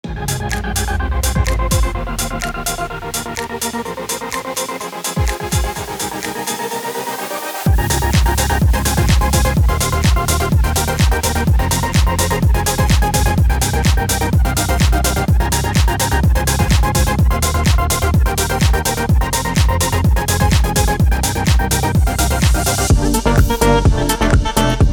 Sultry Stutter House

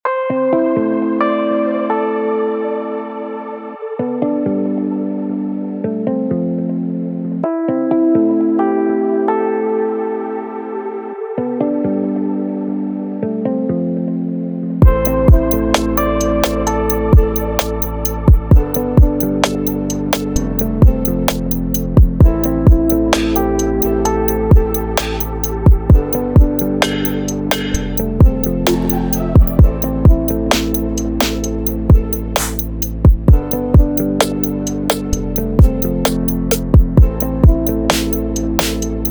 Snare City

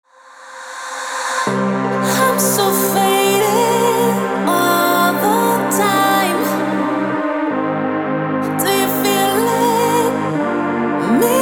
Modern Soul; Black Octopus Sound; Purple Fog; vocal phrases

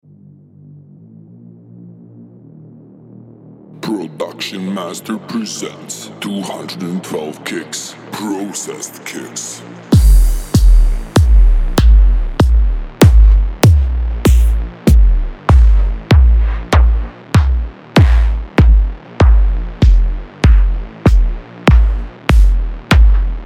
Kick drums; Production Master; 212 Kick drums vol. 1; behemoth ultimate drums elements

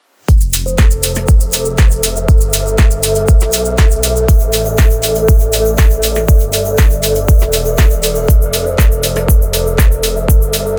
Organic Shakers; Black Octopus Sound; Just Organic Shakers

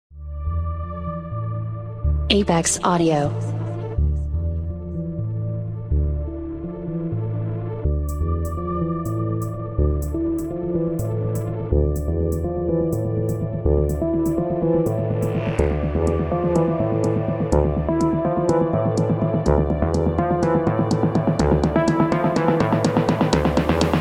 Melodic Techno; Nova; apex audio

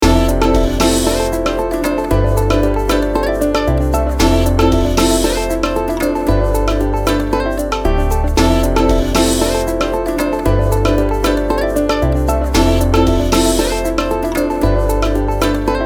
Spiritual Ambient

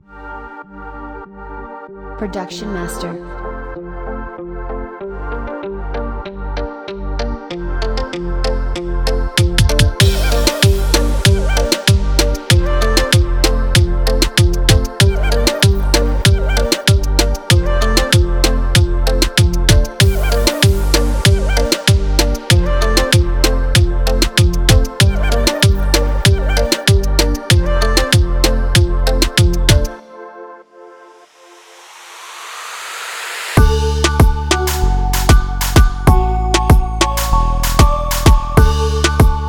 Reggaeton Pop; Production Master; Celestial Vol. 2

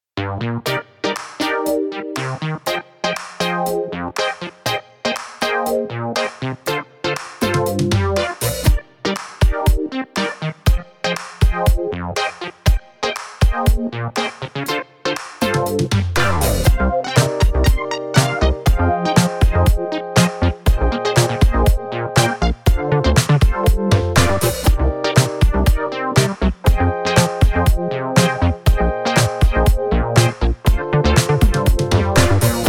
Synth Funk

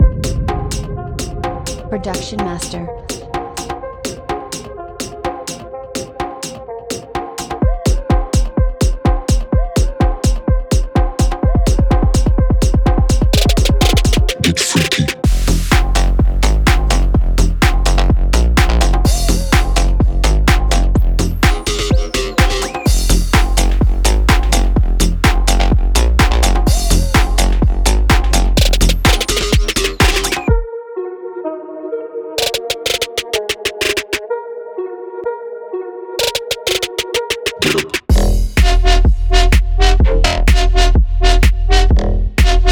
G House Bass House; Ski Mask 2; production master

